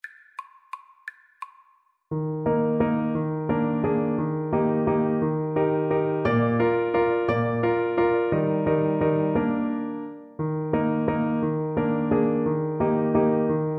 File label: schubert german dance, saxophone